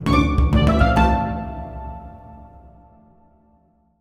card trick; Armello The Bandit Clan

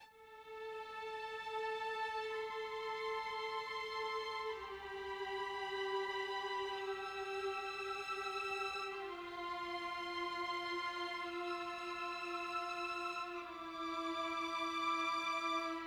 E 5 (Violins)

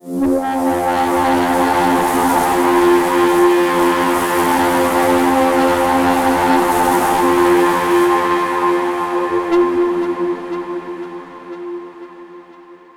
Overlay Drone; Act2 Rezoning

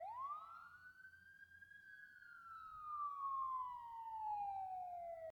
Amb Generic

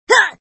Fem Pain

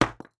masonry hit

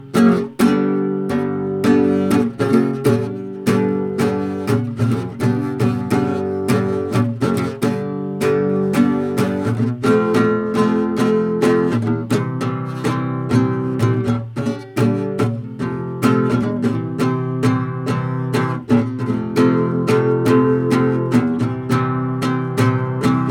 guitar

